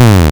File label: buzz sigh; Paradise; machines